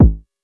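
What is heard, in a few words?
Club bass drum, Jomox Airbase99, Drum Machines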